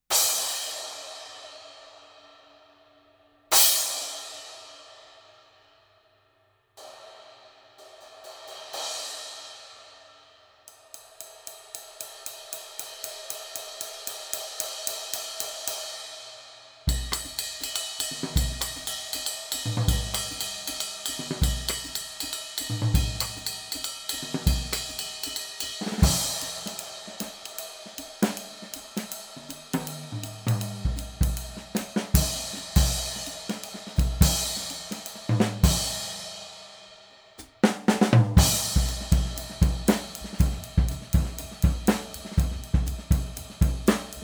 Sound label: Sabian Proto 18 HHX